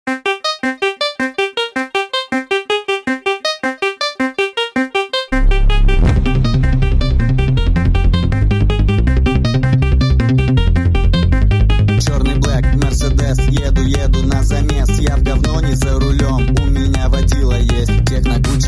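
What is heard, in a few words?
rave techno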